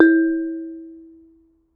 Bonang, Gamelan

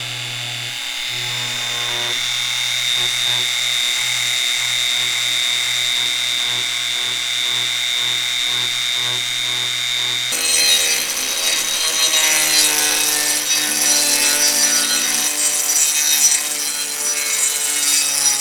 Planer, Workshops

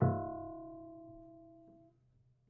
Prepared Piano

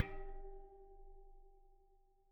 Bicycle Spoke

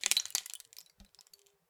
Rain Stick, Percussion Samples

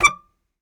Very Short Note, Melodica

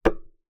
Key Noise, Melodica

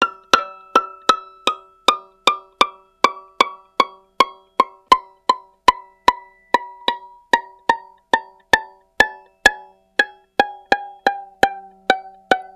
Violin Extended Technique

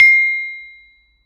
Saron P, Gamelan

